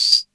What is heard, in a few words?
CR78 TAMB; Roland CR-78; Roland CR-78 Ableton Project